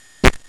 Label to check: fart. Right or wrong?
Right